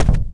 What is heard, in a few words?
plaster, footsteps infected run